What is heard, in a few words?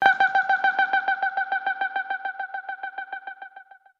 beeper